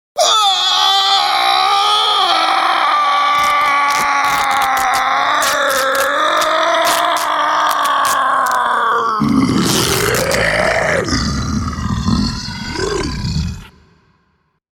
Transformation of man into werewolf with ripping clothes; Monsters; Horror